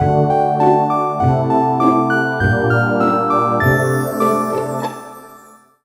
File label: sleep; Yo-kai Watch 1 for Nintendo Switch